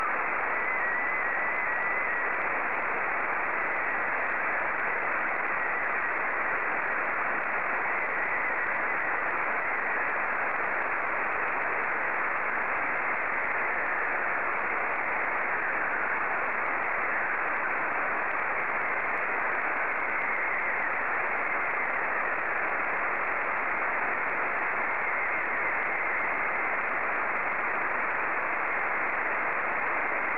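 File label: SSTV, Scottie 1; JL1FQZ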